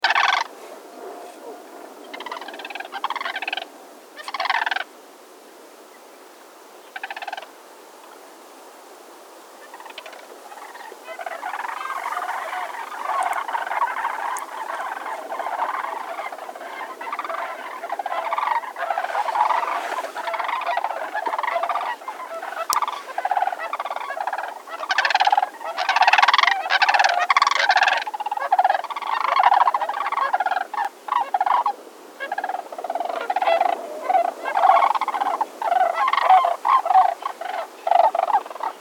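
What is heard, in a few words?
Sandhill Crane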